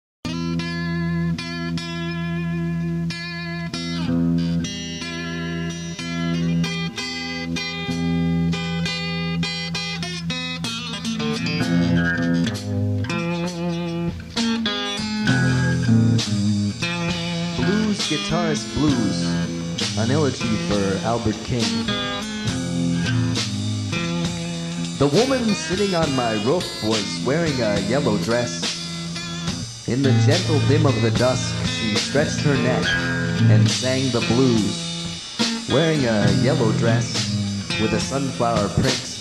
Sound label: Blues Guitarist Blues